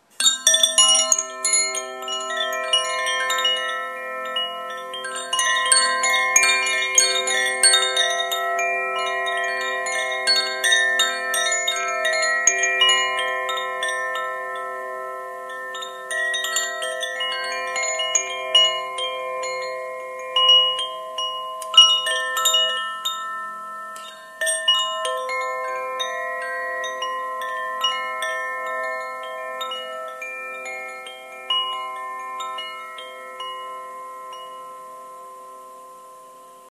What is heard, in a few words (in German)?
Koshi Erde